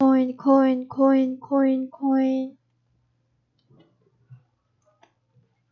coin

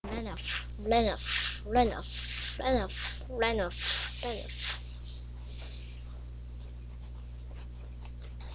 laugh

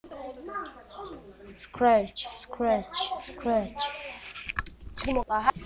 scratch

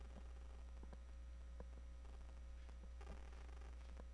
hiss

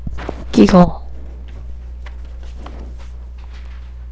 giggle

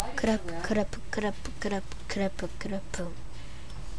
clap

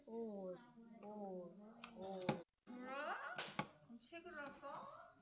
hose

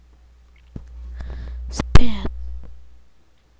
spin

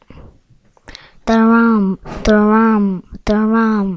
drum

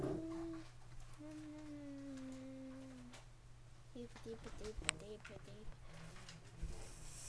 fire

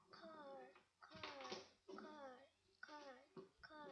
car

